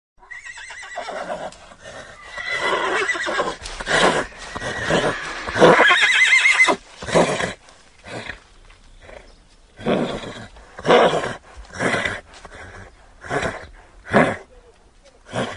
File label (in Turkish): At Sesi; midilli ati; Karisik Sesler